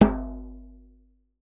djembe

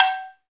CowBells

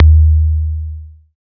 BD, Synth; Sound & Vision - Gigapack II CD 2

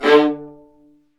STR, Vas Marcato; STR Vas1 Marcato

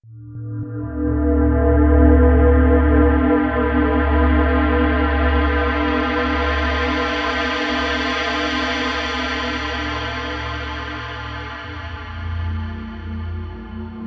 Bell Atmosphere; Atmospheres; Sonic Foundry (Sony Creative Software); Slow Silhouette

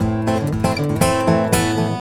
GUITAR 120; Best Service Advanced Media Trax Modern Composer

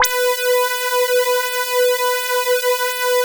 CHUNKY B5, Drums; Drums In Your FACE!

